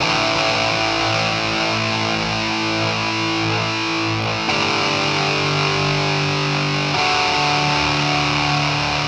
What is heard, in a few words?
guitar, stringed instruments